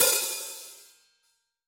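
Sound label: hi hat open; pop-modern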